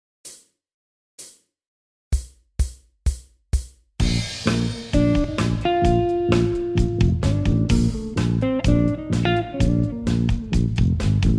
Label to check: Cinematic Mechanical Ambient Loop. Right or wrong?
Wrong